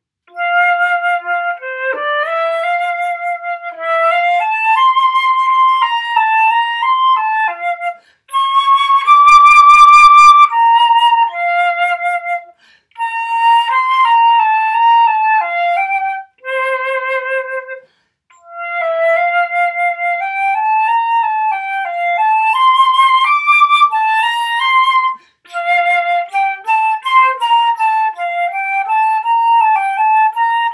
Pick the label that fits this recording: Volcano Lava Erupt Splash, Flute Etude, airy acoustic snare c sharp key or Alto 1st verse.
Flute Etude